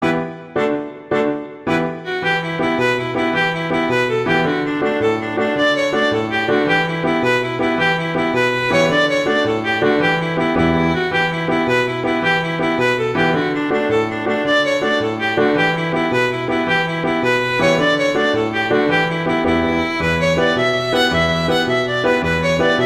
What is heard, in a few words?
Swallow Tail Jig, Fiddle; Fiddle Collection